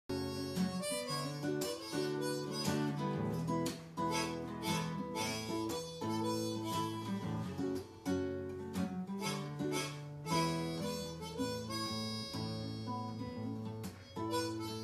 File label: Harmonica Jam; PhavorsMusic